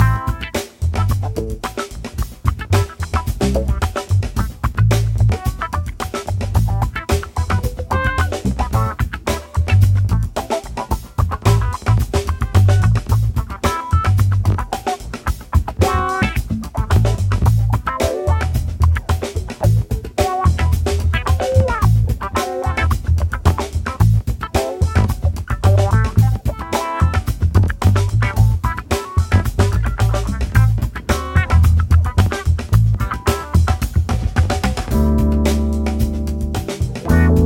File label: Howl solo loop